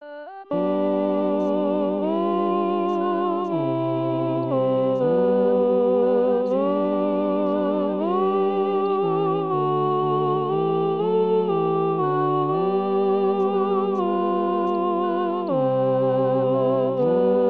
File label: CONTRALTOS; Amazing grace sol